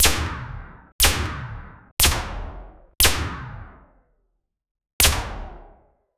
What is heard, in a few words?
single gunshot sound; fps